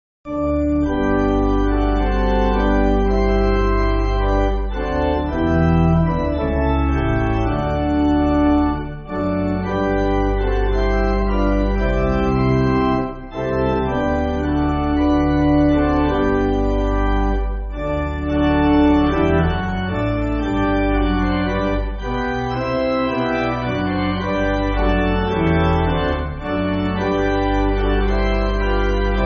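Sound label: Pipe, Music Backing Files for Churches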